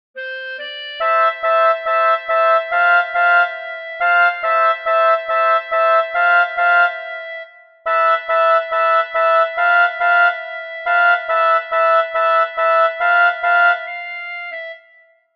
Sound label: chorus